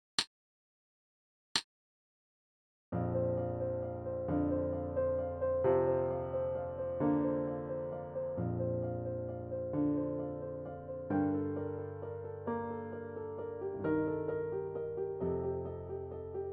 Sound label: evensong accompaniment